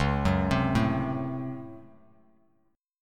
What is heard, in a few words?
Db Major 7th Suspended 4th Sharp 5th Db Bass Standard; arpeggiated; chord shape